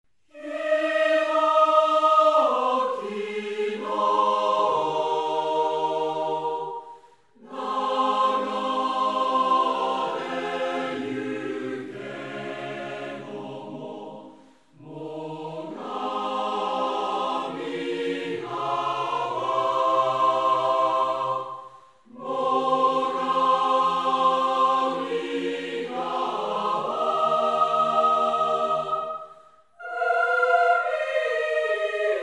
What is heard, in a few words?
song chorus